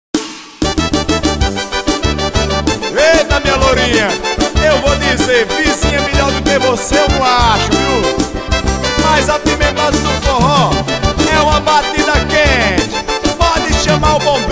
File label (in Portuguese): forró